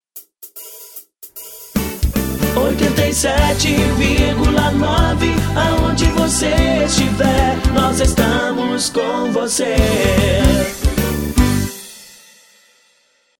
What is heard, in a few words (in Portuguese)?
vinheta cantada